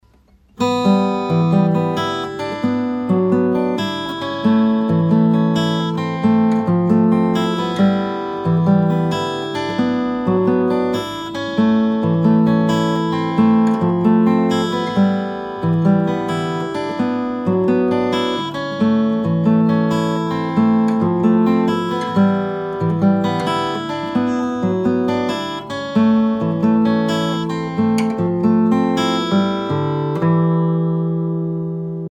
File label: riff